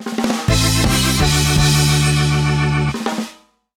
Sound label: track intro; SuperTuxKart